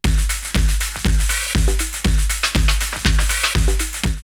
Play Boy! 120, drumloops Rave; X-Static Goldmine; 90 s Sample CDs

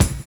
SERIAL BD; Univers Sons

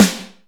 Reverb Kick Snare; PS-37 Dance Synths; Percussion

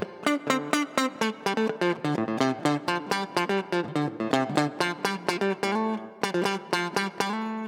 Guitar, 125bpm Dm, Nu Disco; Zero-G; Construction Kits; Speedy

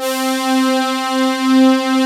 OBX PADS; Pro-Rec - Synthsonic; Z1 PADS

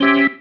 Stab, Organ, One Shots

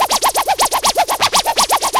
techno moogs; AMG - Now CD-ROM (Roland)

